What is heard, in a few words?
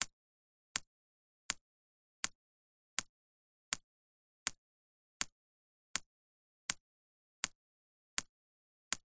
nature water drips constant steady slow on laminated sheet